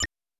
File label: BEEP; Total Control - Action Cutz; DETONATOR